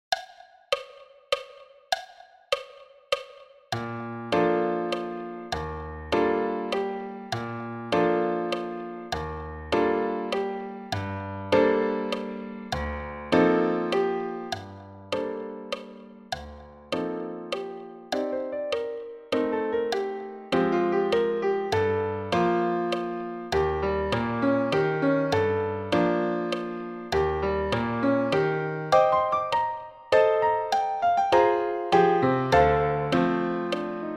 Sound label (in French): Caisse piano solo 100